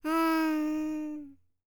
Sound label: HIMALAYAN, World Voices; ILIO; Vocal Planet VOL-5